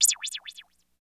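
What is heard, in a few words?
97 LFO CYM-L, LFO cymbal; Phat & Phunky; East West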